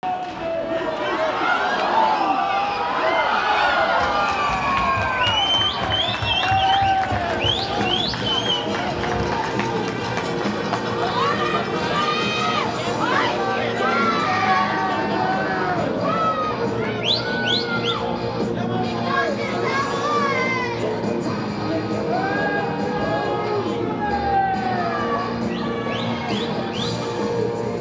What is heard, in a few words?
ice hockey chants